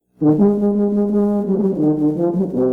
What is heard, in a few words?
tuba